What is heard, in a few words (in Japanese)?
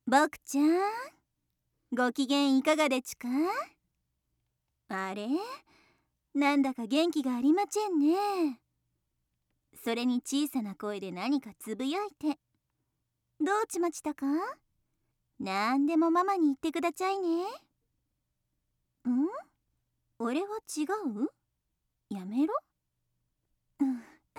キス責め; キス責め編